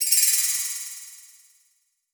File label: Multimedia Internet CD-Rom Flash Animation